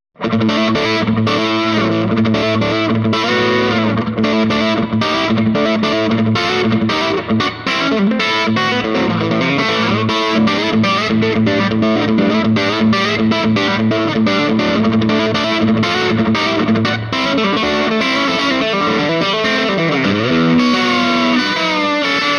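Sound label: Tele Grind, Swart Super Space Tone 30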